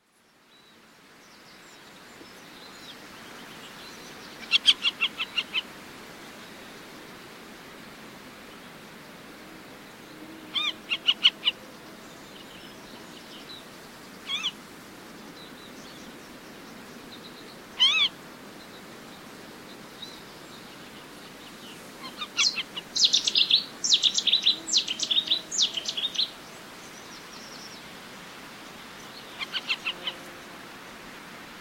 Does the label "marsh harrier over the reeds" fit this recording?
Yes